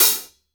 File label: hit, hi-hats